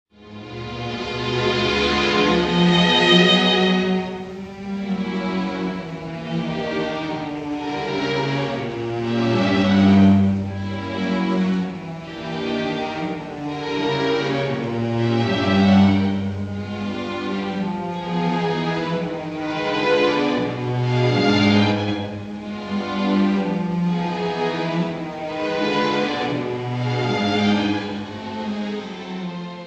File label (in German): Orchestervorspiel; Parsifal